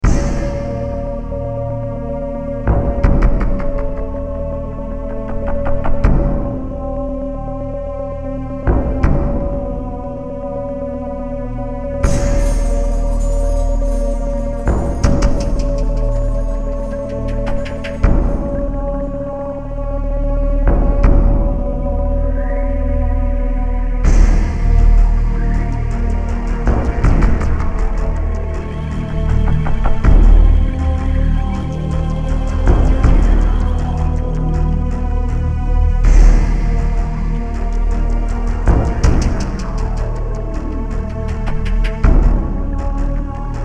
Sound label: strike ambient